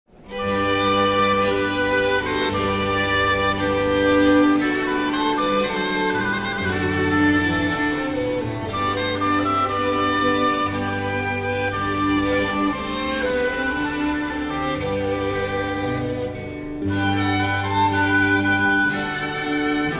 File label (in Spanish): ha venido haendel instr; Ha venido – Haendel1